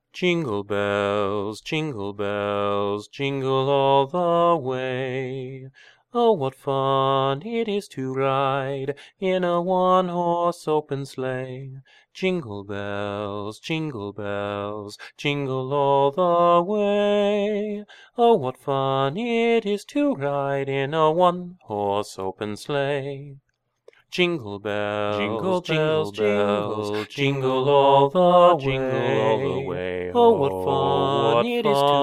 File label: Jingle Bells